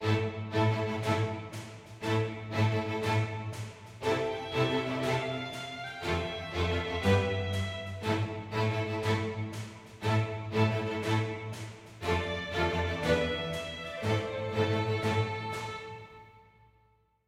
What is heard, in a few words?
heroic med; Quivr; PS4 Windows gamerip 2016